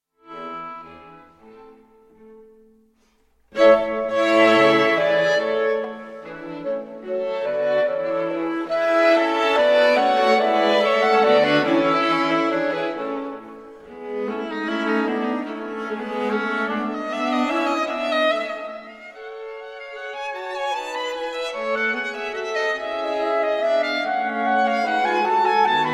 quintet in a major for clarinet and strings menuetto trio; 3rd movement